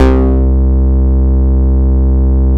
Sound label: Basses C; Vengeance Samples